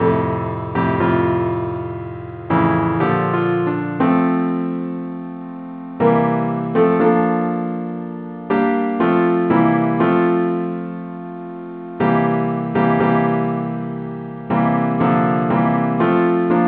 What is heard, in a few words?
simple acc key f